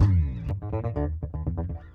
Bb 120 Funk Rock

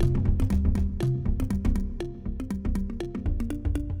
Mallimban C7 120

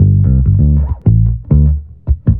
Bass D 100

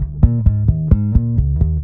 Bass Eb 131

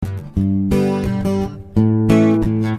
Strummed G,A 86

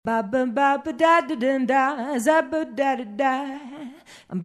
Jazzy 100-120

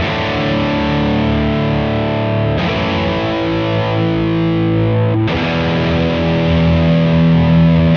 90 Outro Rock E; Loops

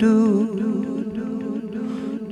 Vocal Echoes

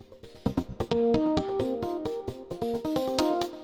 Funky 125 Cm7

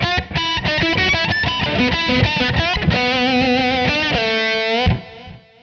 E16 89 solo

